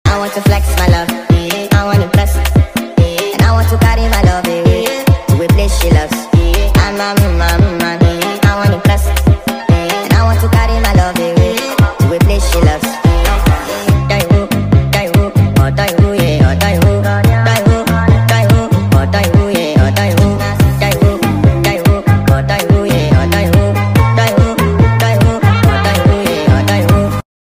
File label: Sped Up